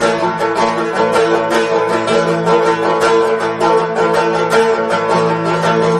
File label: banjo; music